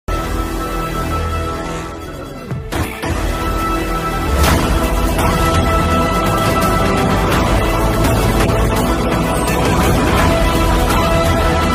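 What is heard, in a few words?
teaser background music; spy movie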